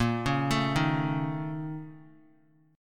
A sharp Minor Major 7th Double Flat 5th A sharp Guitar; arpeggiated; JGuitar